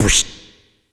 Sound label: PERC; ZERO-G; Datafile